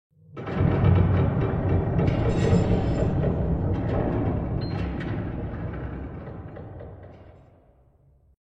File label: minecraft cave sound